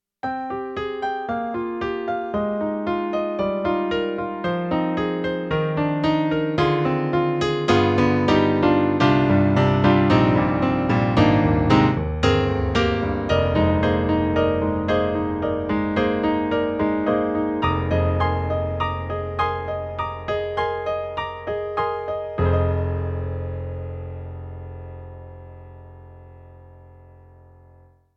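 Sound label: Roland DP603, piano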